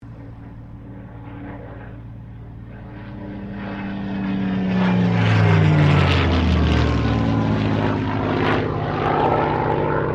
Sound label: AIRCRAFT SUPERMARINE SPITFIRE MK-XVI CIRCLE STEREO; Airplanes